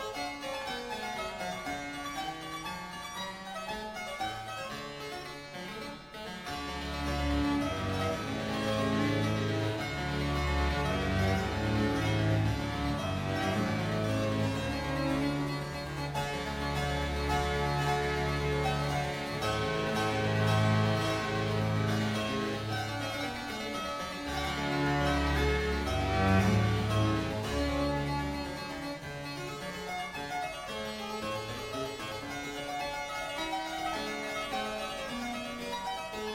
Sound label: Harpsichord Concerto; JC-Bach